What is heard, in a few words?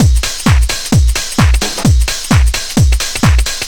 bouncy castle, Deep House 130bpm